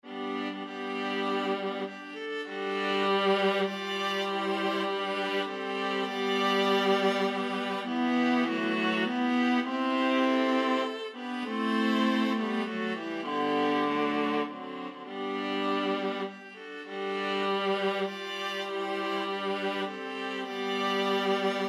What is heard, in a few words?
mendelssohn nocturne viola ensemble